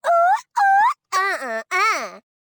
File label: Woman Dance Happy; Zomboid; Lifestyle